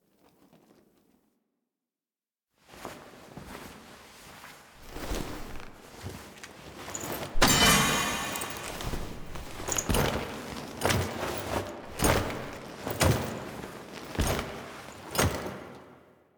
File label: Foley Stand Up; Ballas; Throne Room; Warframe; The New War; Narmer Outpost